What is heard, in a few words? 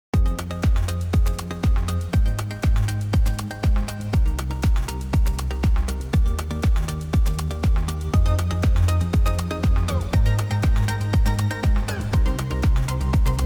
16 khz added to a mix